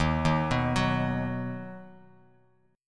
Eb Minor Sharp 5th Bass Standard; Ebm#5 arpeggiated; JGuitar

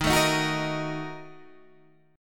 A sharp Major 7th Suspended 4th Sharp 5th

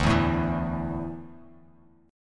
D Suspended 2nd D down; strummed

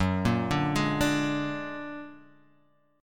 Bb Augmented 7th Gb

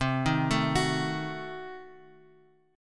C Diminished 7th down Bass Standard; arpeggiated; JGuitar

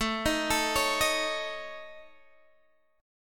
A Major Flat 5th A Guitar Open D, chord

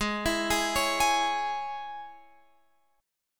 Ab Suspended 4th Sharp 5th Ab, Guitar Open F; Absus4#5; arpeggiated; chordshape

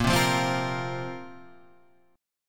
Bb Suspended 2nd, Bbsus2 chord; strummed

